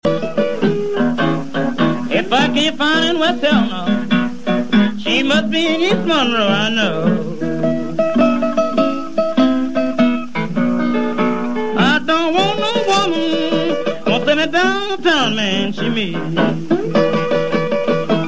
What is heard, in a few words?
blues